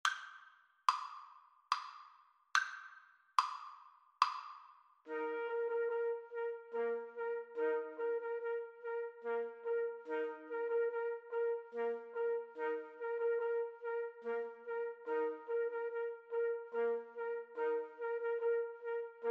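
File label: bolero trumpet, karaoke; Trumpet Trio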